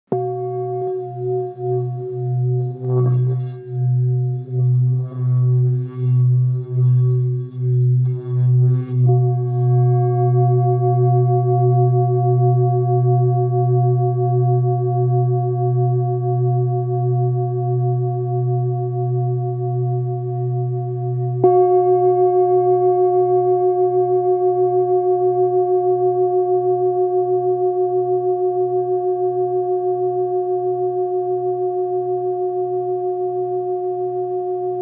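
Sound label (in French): Bengale Si 1-1.1kg, Bol Chantant Tibétain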